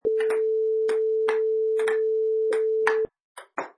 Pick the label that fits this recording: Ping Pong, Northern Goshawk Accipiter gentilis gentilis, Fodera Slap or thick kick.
Ping Pong